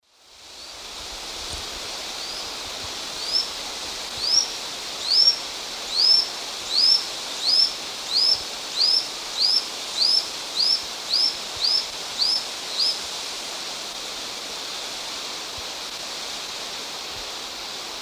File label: Micromonacha lanceolata, songs 2 birds; S. America; Eastern Lowlands